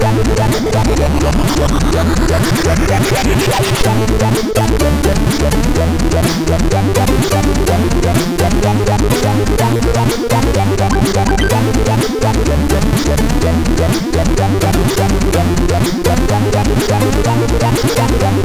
sid MOS6581R3

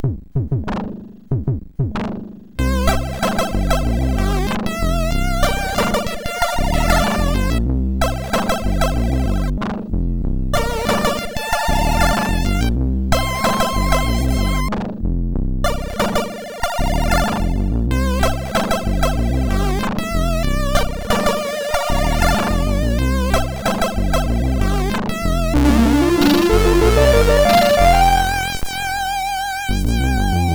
Twilight, sid MOS6581R4

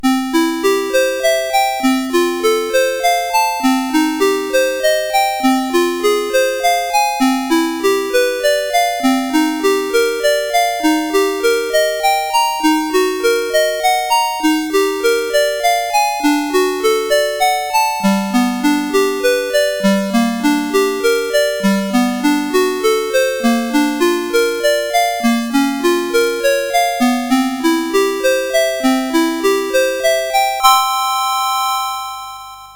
Some Chords in Arpeggio Style, CSG8580R5

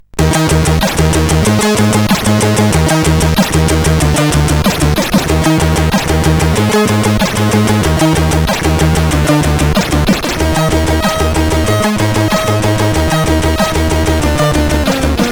Relaxing, sid CSG8580R5

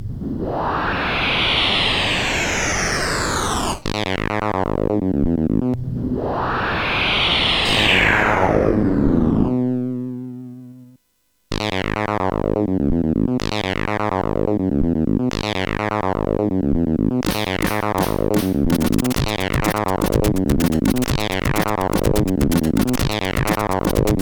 Techno, CSG8580R5; hvsc; Eloquence

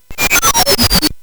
Synth Sample, CSG8580R5; hvsc

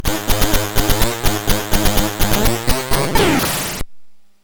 Game Music, sid MOS6581R2

